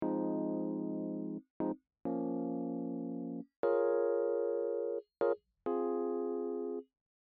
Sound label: Final Credits Rhodes